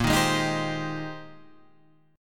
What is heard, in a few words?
Bb 13th Suspended 4th; Bb13sus strummed